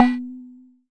Jomox MBrane, drum machines; Jomox MBrane 11

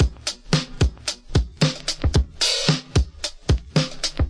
112 dirty groove bighats, drum loops

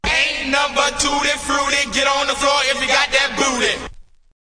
rap chor, male singing

